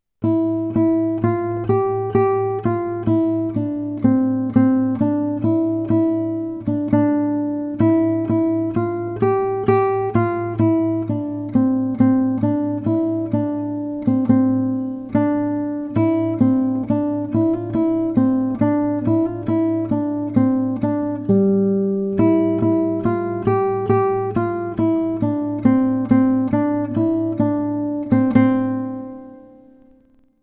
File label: solo